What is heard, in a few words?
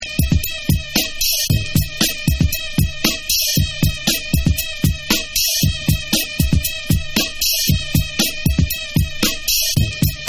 RICH DRUM BEAT Drum